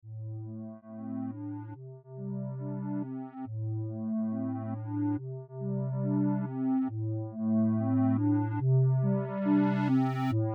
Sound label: broken lullaby